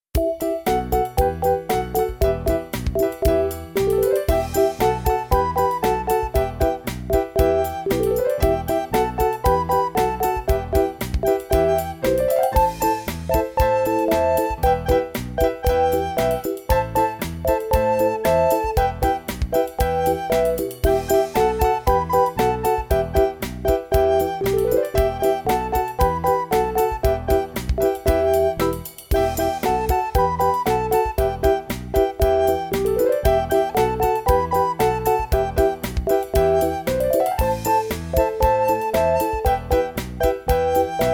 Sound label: recorder tea bag